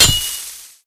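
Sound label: Impact Pulse Blade Sand; Titanfall 2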